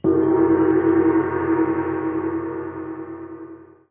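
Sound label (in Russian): гонг одиночный длинный мягкий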